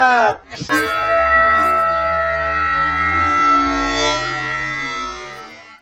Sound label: eeeeeee